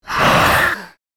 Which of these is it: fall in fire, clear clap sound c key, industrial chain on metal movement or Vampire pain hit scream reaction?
Vampire pain hit scream reaction